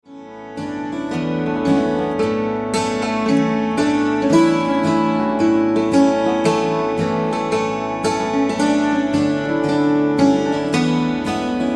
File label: Wedding March; Cape Breton